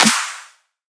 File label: Riddim Snare Clap